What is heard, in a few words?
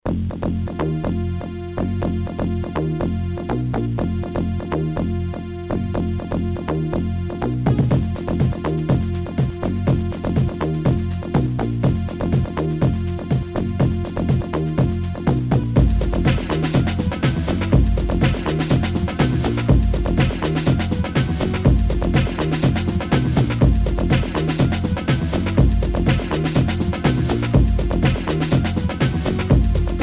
music